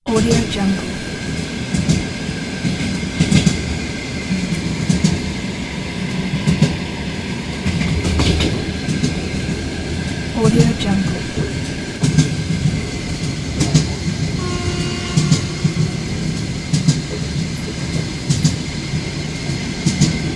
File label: train carriage interior pack